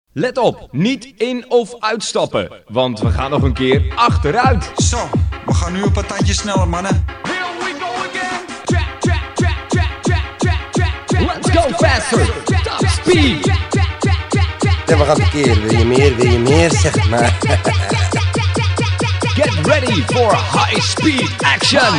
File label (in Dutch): Kermis Jingle Mix; Kermis Mixen